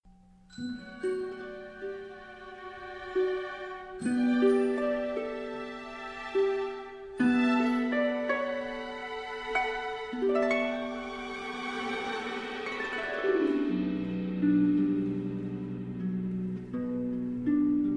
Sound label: Milonga en el Viento for harp Orchestra; 1min; Shorter